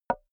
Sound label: wood board knock